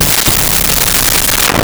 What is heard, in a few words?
WRONG LETTER